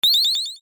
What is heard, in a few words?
Lovely Ringtone; ringtones